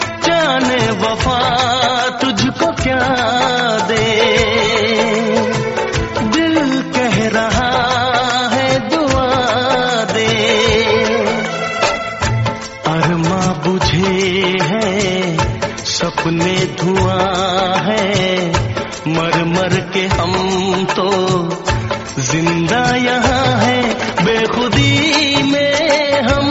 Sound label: romantic love Bollywood ringtone